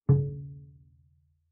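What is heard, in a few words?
Bass pizzicato fortissimo sul A D2, Double Bass; MIS Pitches - 2014; Strings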